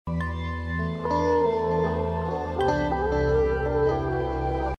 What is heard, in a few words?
slow sms; latino